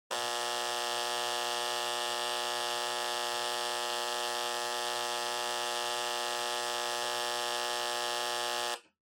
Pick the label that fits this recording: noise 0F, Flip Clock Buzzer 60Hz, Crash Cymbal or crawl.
Flip Clock Buzzer 60Hz